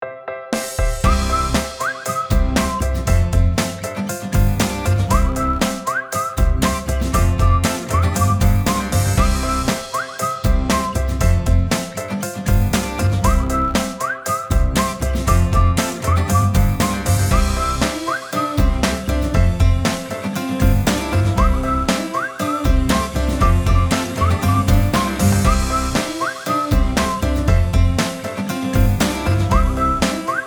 whistle in the sun

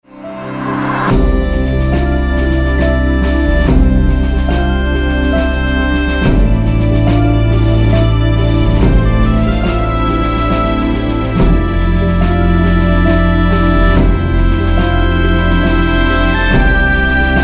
Dear Friends Christmas Short Instr

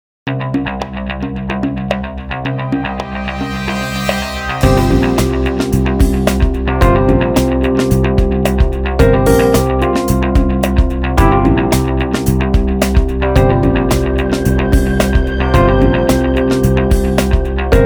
INST

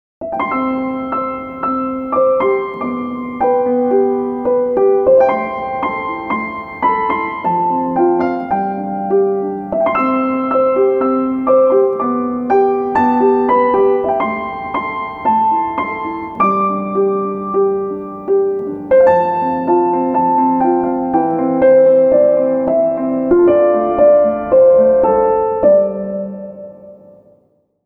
Piano Theme

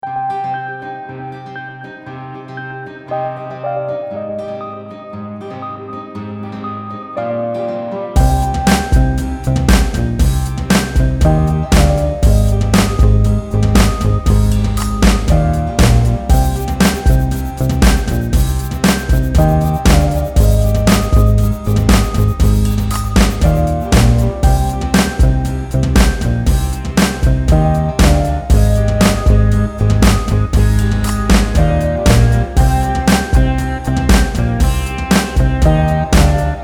TAKING OFF

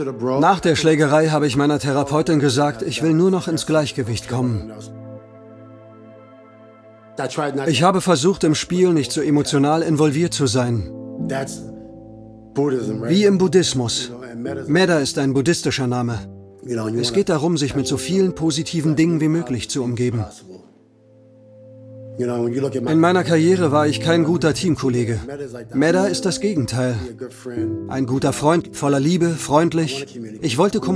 90.00 BPM

